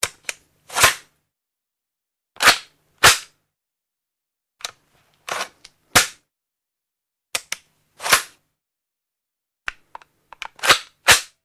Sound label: Gun Lock n Load